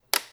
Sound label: switch; p00gie